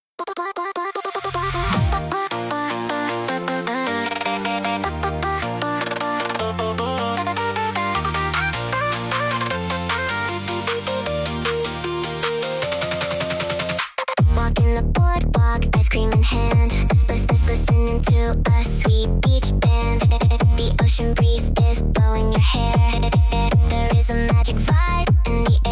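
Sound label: мощных треков для спорта; muzyka v tachku bolshaya kollekciya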